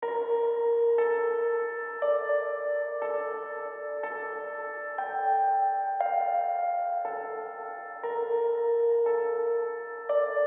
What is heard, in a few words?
Piano Lesson; fatal frame iv